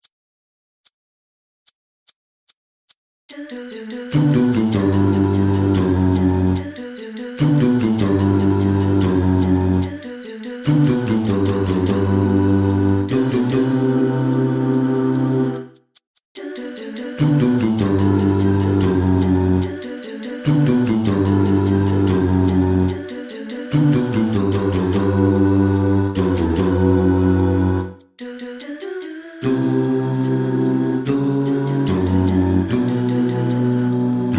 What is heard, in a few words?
Bass